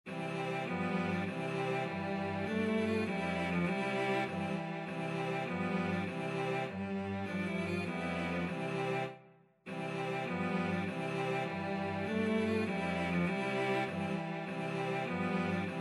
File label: cello quartet